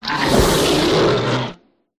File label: werewolf boss attack voice mid